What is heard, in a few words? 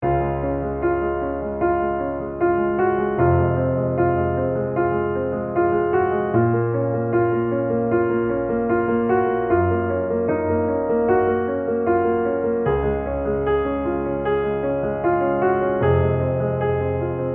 Piano